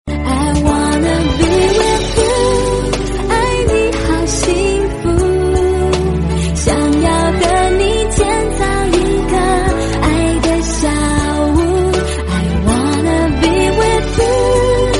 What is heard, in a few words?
english song